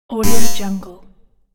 sf automaton robot

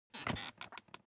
object chair office creak sit up short

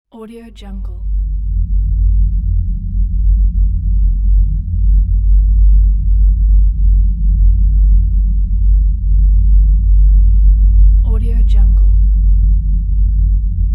low rumble

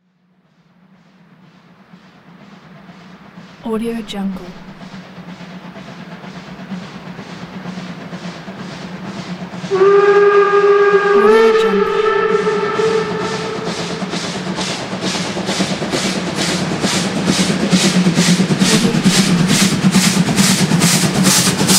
steam train with whistle

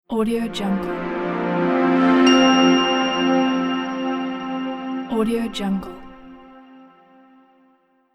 subtle logo reveal

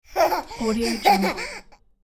childrens laughter

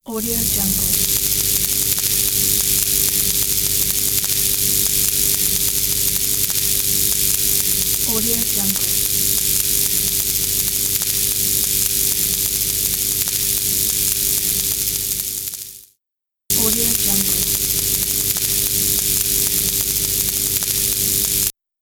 electric buzz

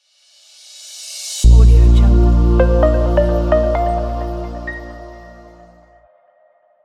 classic minimal logo

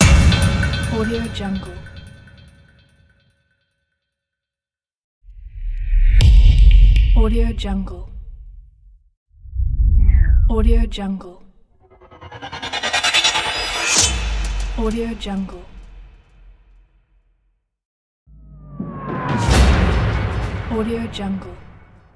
transition impacts

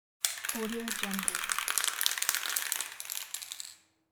spray paint shake

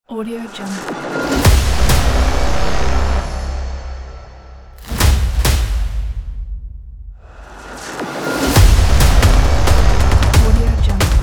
trailer intro; hexagonaudio

